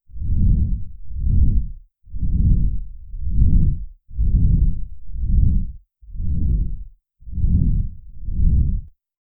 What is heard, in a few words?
deep powerful yeti roar; vocalizations